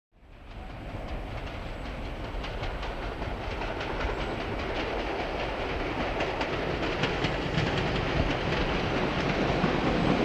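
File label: In Electric Train Station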